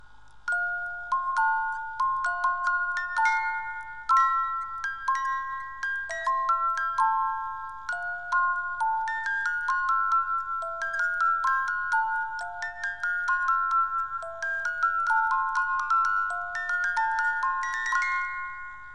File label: Kleine Nachtmusik, Music Box